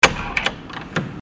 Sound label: door